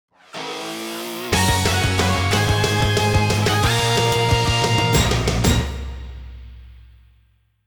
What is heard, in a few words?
Win Theme; Brawlhalla; TMNT